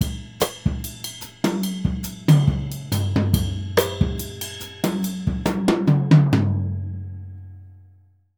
Jazzistic; Univers Sons

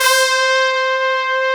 STAB BR, SC55 BRASS

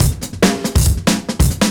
Beat Style, 140bpm, breaks

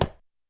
Tabla Mute, YAMAHA RM1X

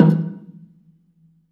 bela D#2, belafon; USB Soundscan vol.60; AKAI

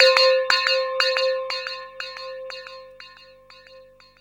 BELL ECHO; DRUMS & PERC

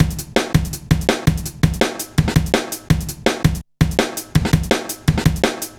Heavy Live, Jungle, Drum Loops 165.5; Zero-G Jungle Warfare 1, 2 & 3

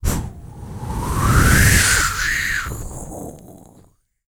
VOX FX, BREATH FX; Spectrasonics; Vocal Planet; Jazz FX

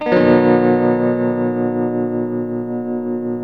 TELTMUMAJ C, TELE CHORDS, Guitars; Spectrasonics; Hans Zimmer Guitars VOL-1

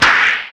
FX SPACE, SPACEFUNK; Sound & Vision; Gigapack II CD 2 (Roland)

